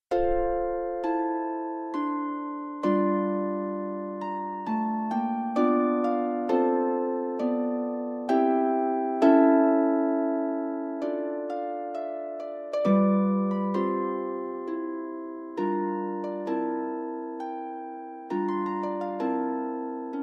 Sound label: Famous Music for the Harp